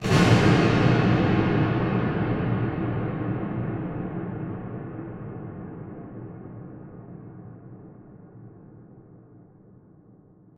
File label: Huge Verb Tail, Long Reverb Tails; impact samples